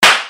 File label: slap